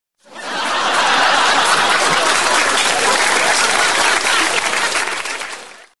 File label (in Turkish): gulme kahkaha sesi